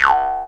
C# JAW, Primitive Instruments

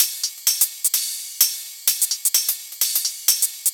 Loops 128BPM, Drumloops

